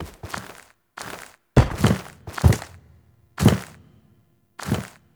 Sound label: Get Up Foley; Warframe; The New War; Cinematics; Ballas Lotus Beam Battle